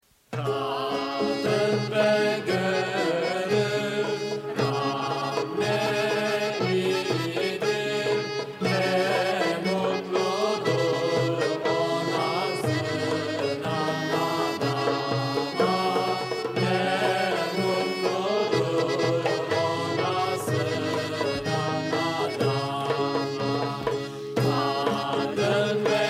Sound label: hymn; turkish; GRN; 100 Stories